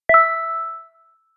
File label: text receive